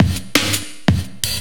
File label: loop, Drum & Bass, 170 BPM